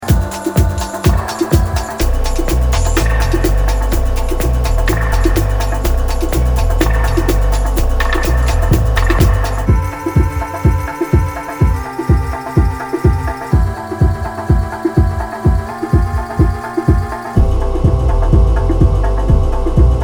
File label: Arp Sequence Dub